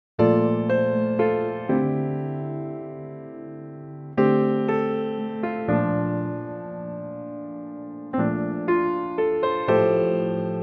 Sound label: piano F